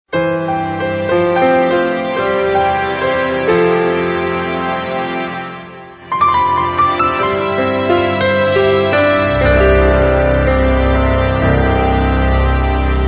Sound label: Modern Orchestral Ident Acoustic